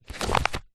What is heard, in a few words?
household magazine glossy pick up